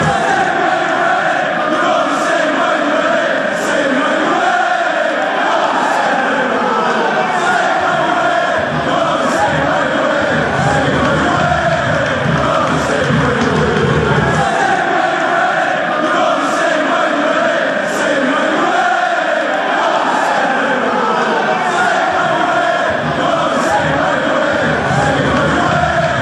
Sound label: you only sing when youre winning